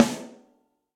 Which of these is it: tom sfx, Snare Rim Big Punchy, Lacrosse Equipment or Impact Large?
Snare Rim Big Punchy